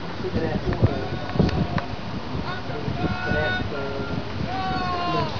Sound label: adjutant call